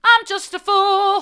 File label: i'm just a fool hard, vocal